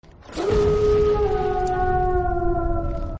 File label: werewolf horror howl